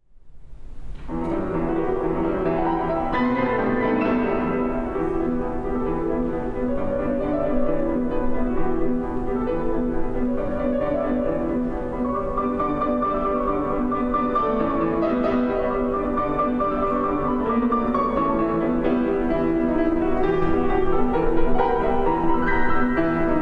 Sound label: Theme, Piano; Angry Birds